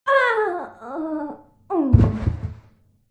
bot human female diver death

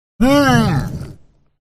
dark prince attack voice middle

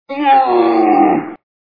die

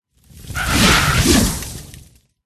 witch attack voice mid

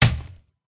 werewolf boss attack contact up

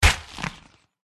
dog attack contact up

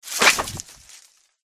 flower attack voice bottom

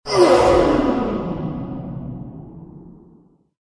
angel wound

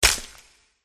murraliy korr attack contact bottom

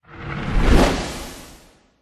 panther

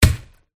goat attack contact up

